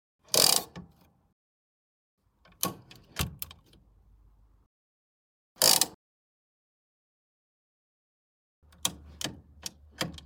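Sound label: truck handbrake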